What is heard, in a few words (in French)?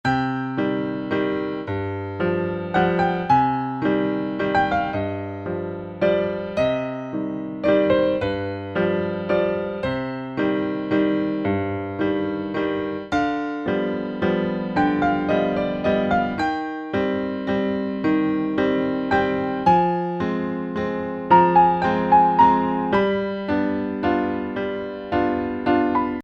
Classique